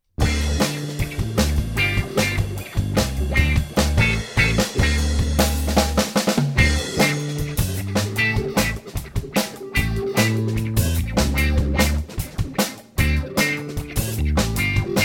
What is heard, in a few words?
Karaoke